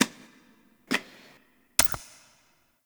Epilogue Foley; Zariman; Cinematics

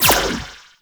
Choir Erupt Firing Eye

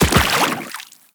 Land Water, Footsteps; Warframe; Teshin

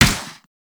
Pursuit Space Ship Turret Fire; Warframe; Grineer; Turret B

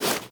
Wall Jump Foley; Footsteps; WallRun; Warframe; Characters; Tenno

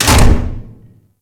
Galleon Barrel Drop; Warframe; Ambience; Grineer Galleon; Galleon Props

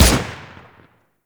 Grineer Emplacement Shot; Weapons

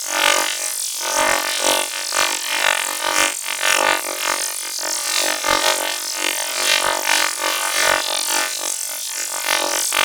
Laser Loop; Warframe; Corpus

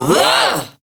Punch, Barks; Kuva Lich; Persona C; Ability

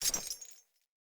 Grunt Foley Melee Swing Chains; Enemy; Sinecure

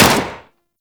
Pistol Shot; Guandao

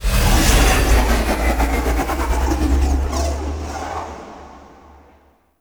Ship Showroom Ship Depart; Corpus Ship

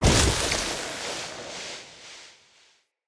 Stone Projectile; Powers; Fey; Ward

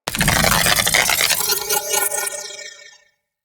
Reanimate; The New War; Sentients

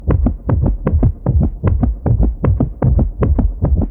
Heartbeat Loop; Gameplay; Golden Maw; Warframe; The War Within

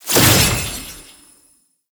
Summon Rune Pick Up; Warframe; Uriel; Vythelas

